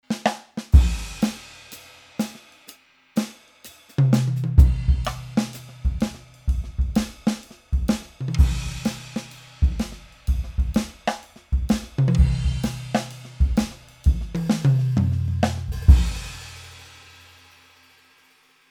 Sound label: PEARL Mimic Pro Jazz Kit